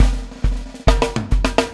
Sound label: PEAK, Backbeat